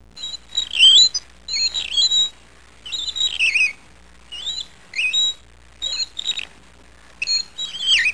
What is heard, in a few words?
SQUEAKY